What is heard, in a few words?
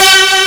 STACK, FAIRLGHT; East West; Global Sounds